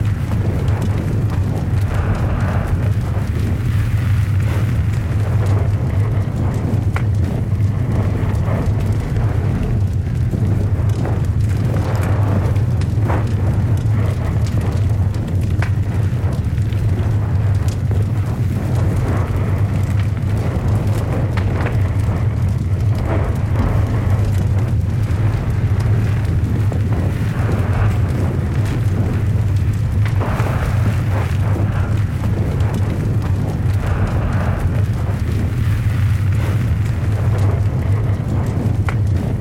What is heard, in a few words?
ambient blaze distance; bgm